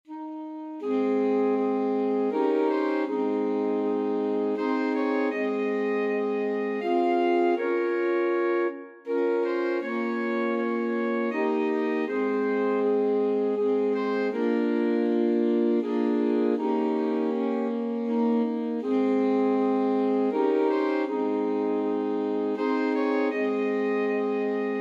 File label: away in a manger, alto sax quartet